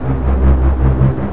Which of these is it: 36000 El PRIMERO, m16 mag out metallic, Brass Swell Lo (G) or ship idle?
ship idle